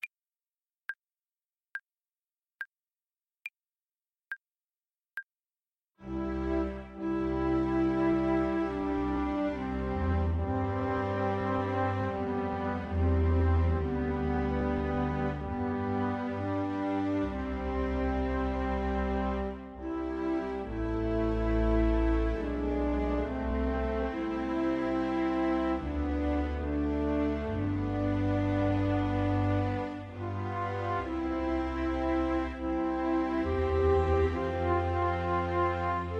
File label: piano